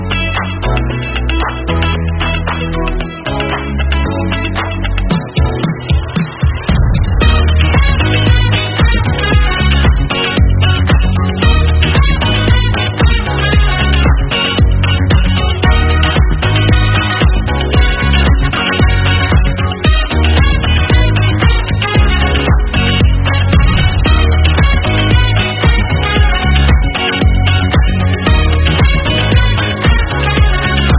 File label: Instrumental